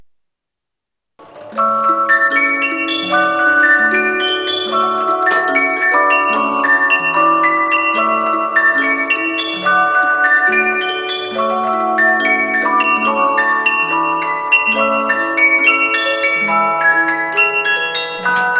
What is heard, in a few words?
polyspiraal